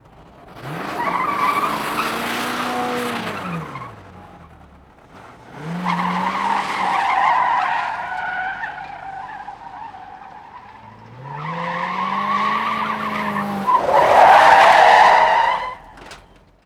Skid And Peel Away; Vehicles

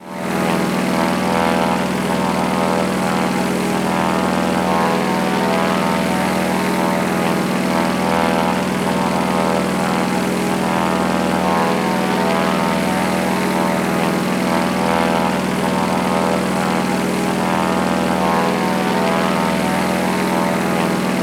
Plane Propeller Idle; Vehicles; Aircraft

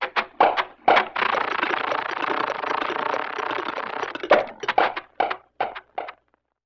Comedy Motor; Vintage Cartoon; Foley

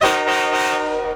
Train Horn Blasts; Vehicles; Trains

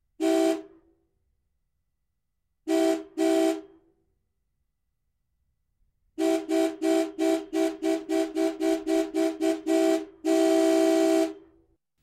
Car Horn - Antique

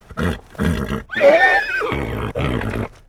Horse Snorts Whinnies

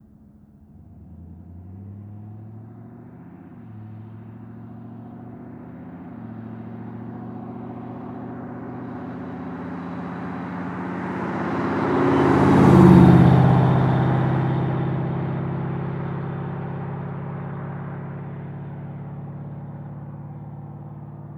Muscle Car Slow By 20MPH; Vehicles

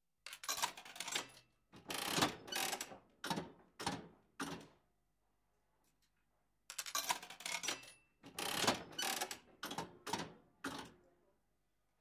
Slot Machine Loser

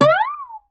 Guitar Plink Slide

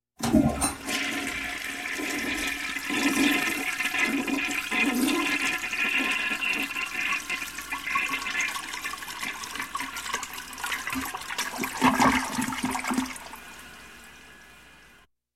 Toliet Flush

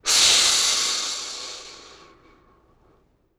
Alligator Hiss

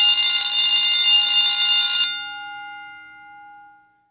Bell School Traditional

